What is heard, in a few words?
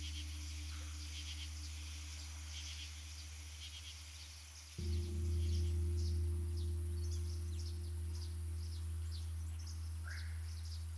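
Ambience Dojo Dragon Loop; Fruit Ninja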